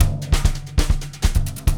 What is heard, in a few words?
BR SMBA WU, drum